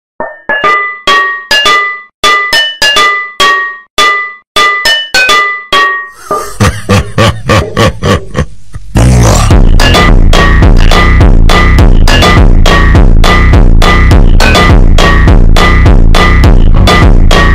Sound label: funk slowed